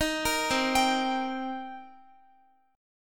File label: C Minor 7th C Guitar; Cm7 arpeggiated; x,x,13,15,12,14